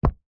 foley footstep single trainer wood floor gentle soft